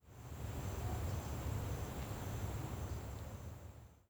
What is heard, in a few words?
dialog, rainy soundscape